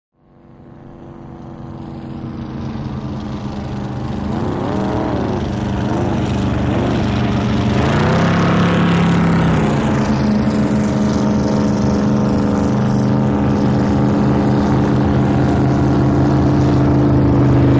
Drag Boat By Slow sev

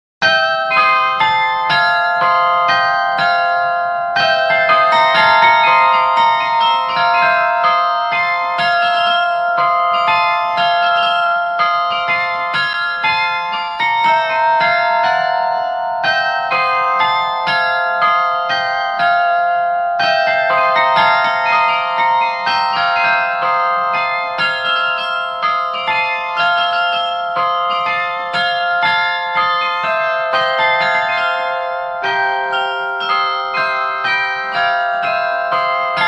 Clock, clock chimes rings; Fortnum Mason

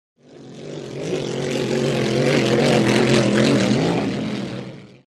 In Outboard Boat By, motorboats

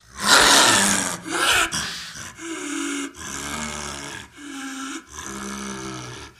Dinosaur Roar Growli

